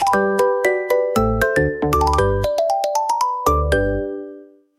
karakuri toy box, jingles; free jingles

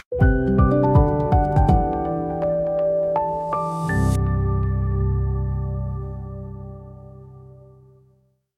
patto kanketsu sound, jingles; free jingles

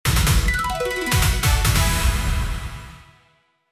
bell call

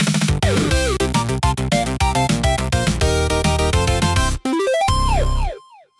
8bit victory call short; free jingles

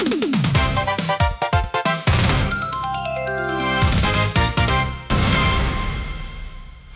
aka lamp rush call; free jingles

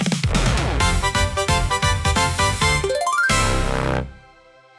aka lamp rush call short, jingles; free jingles

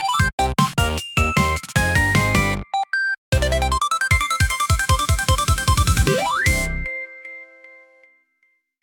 yatta ne success call short; free jingles

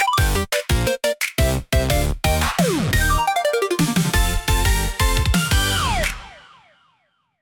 bonus lamp tento call variation, jingles; free jingles

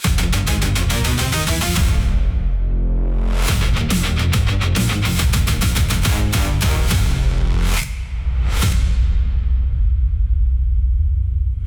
senko no signal; free jingles

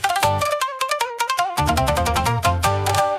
shamisen flash cut; free jingles

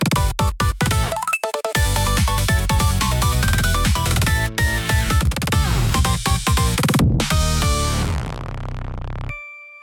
high tension cute pop; free jingles

